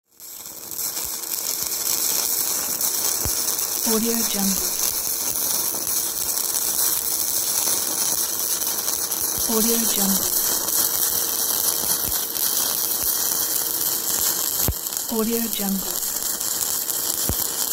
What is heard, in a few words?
welding sparks